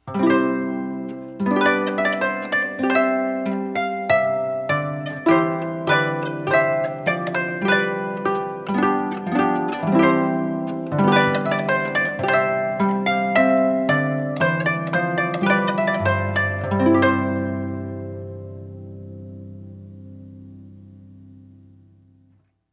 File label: trumpet voluntary, harpist